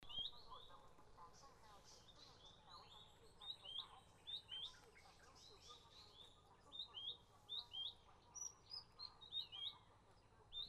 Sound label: Buff breasted Babbler, vietnam birds; NCT